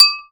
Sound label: GLASS TING, percussive